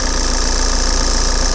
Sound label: opel astra, vehicles